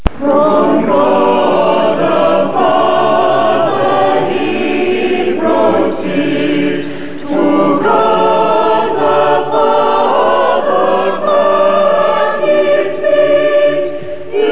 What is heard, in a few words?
choir